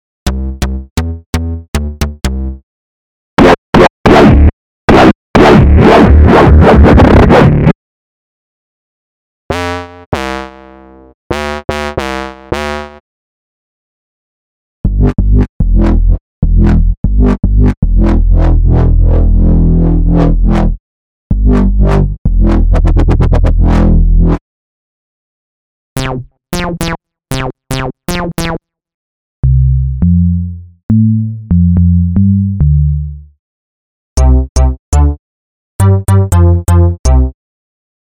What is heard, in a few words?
bass perc fx; corona limits demo